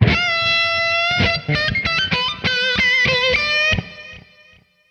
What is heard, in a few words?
E16 89 solo